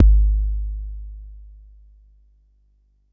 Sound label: Bassdrum, Jomox Xbase09